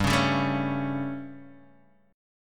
G Suspended 2nd Flat 5th G down Guitar Half Step Down, chord